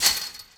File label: Fence Chain Rattle; Foley - Rattles